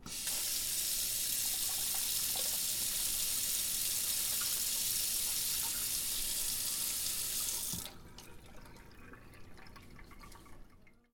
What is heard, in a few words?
Kitchen Sink Sound